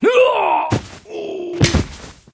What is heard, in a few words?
silverwood dead, monster